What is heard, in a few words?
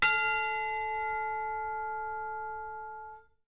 tubular bell high